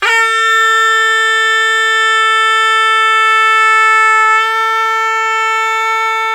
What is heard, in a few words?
BI SUA A4, BISUA REED; Spectrasonics; Heart of Asia